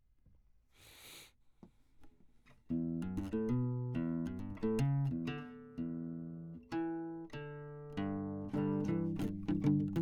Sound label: Classic Guitar